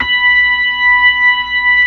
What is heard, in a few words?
B-3, Brite; Keyboards of The 60's and 70's